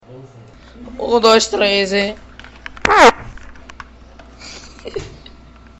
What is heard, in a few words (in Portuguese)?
peido